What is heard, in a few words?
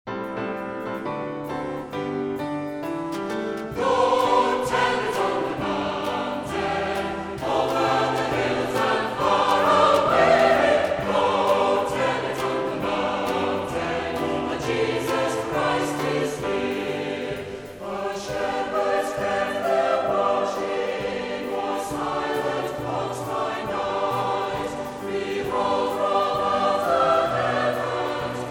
Band And Choir Go Tell It On The Mountain